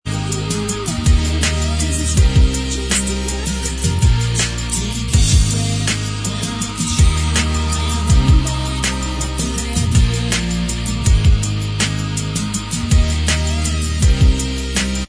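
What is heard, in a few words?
backing vocals track, backing tracks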